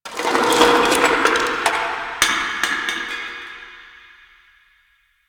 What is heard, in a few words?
Subway Garbage Amb Misc; Nineteen Ninety Nine